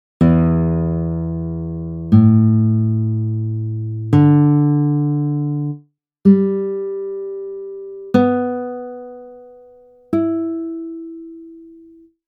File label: Standard Tuning